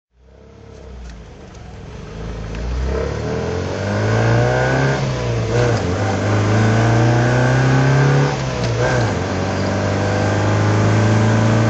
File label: mgb drive